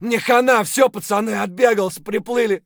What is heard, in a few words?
hurt near death; Escape from Tarkov; scav2